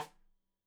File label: Side Stick Stereo; Deeply Sampled Drums; SMDrum